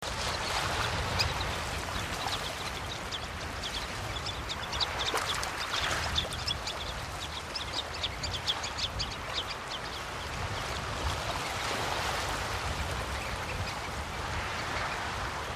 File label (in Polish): piaskowiec Calidris alba